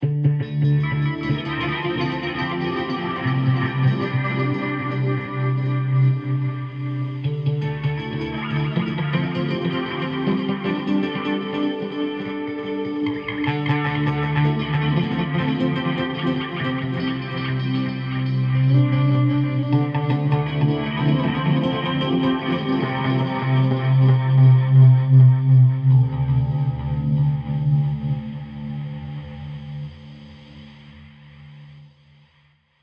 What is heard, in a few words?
Cascading Delay Guitar Effect no distortion; garageband guitar